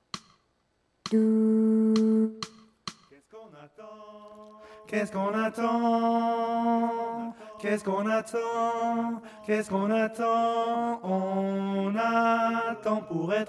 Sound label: tenor, chorale; etre heureux